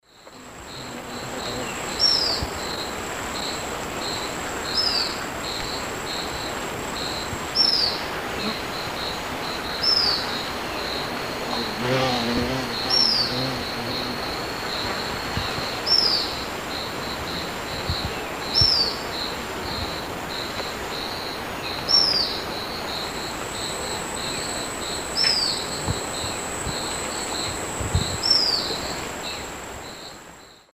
Neopipo cinnamomea, calls pair; S. America; Eastern Lowlands

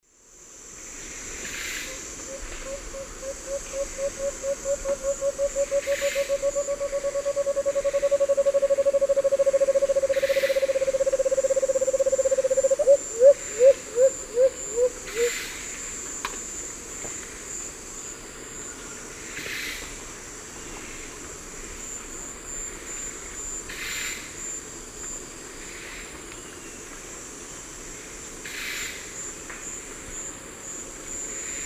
Chamaeza nobilis, song; S. America; Eastern Lowlands

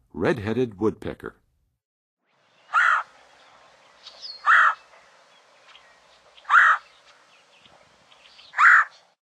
Red-headed Woodpecker; North America; Western USA; Western USA Songbirds

